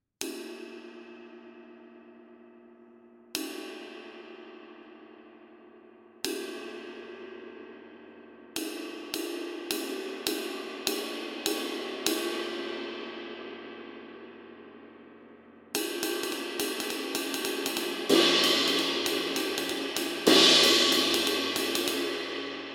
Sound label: 10 ride, ride cymbals